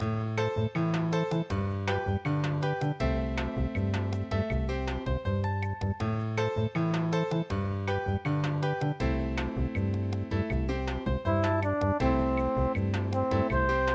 bossa

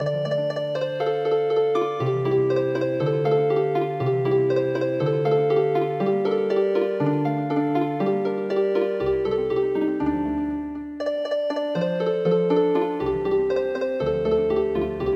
Healing Harp, traditional

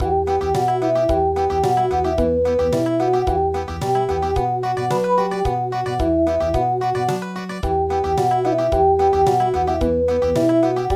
Country

